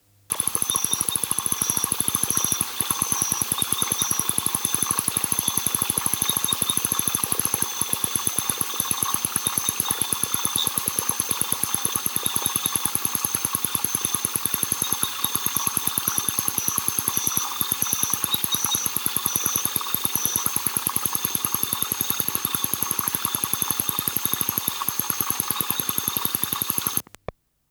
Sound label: Ansonia sp. nov., Mulu; Mulu 4